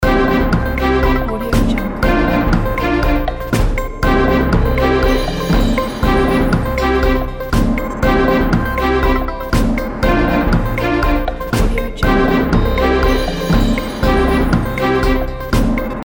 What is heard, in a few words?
tv news